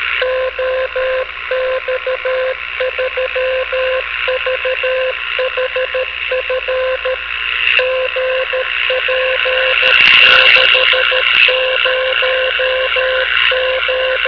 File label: VHF beacon; VHF Radio Aurora Audio